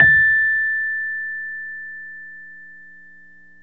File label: RHODES A5, Rhodes electric piano